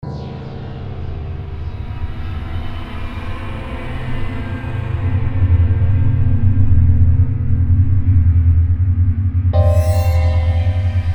ghost in cellar

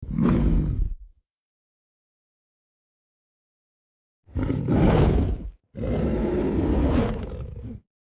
bear roar cinematic growling

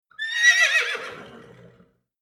horse neigh

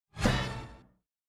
cartoonish hit

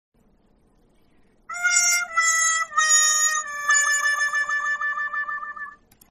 wom womp

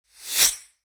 fast air transition sweep

